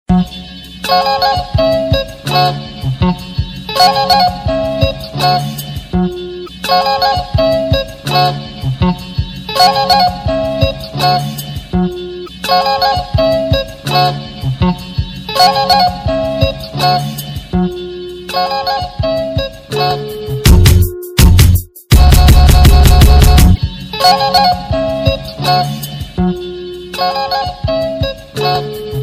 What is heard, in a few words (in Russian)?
medlennyy krutoy